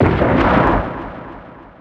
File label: explos